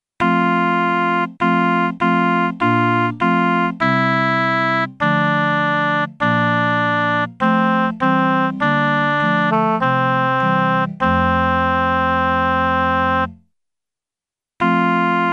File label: Lo how a rose, Tenor